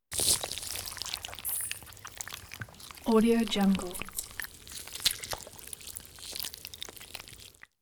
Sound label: vegetable abuse